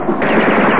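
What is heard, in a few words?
machine gun; Wargame Construction Set v1.0 (1987); SSI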